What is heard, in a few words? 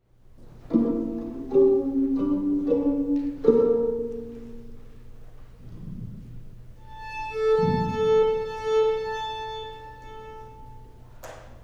three for violin 1 in circles